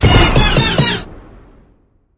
corner boost, wall jump trick

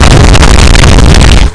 slam impact; abrams

